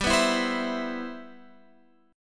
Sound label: G 6th